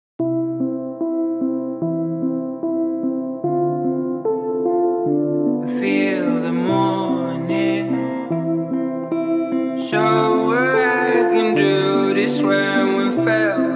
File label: guitar